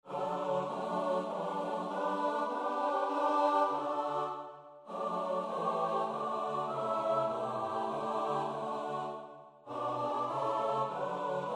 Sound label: midi; haydn; o esca viatorum pokarmie naszej drogi